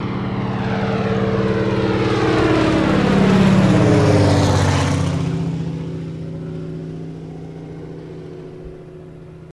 Prop Plane DHC, de Havilland Canada, Single Engine Medium By On Tail, Off